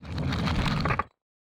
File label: chests shulker close; Mineclonia; mcl chests